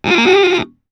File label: cuica